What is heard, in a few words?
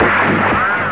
gunshot